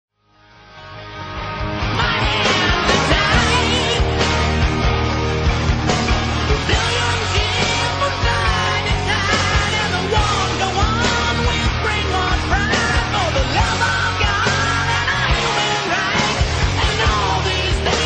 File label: rock ringtone; iphone se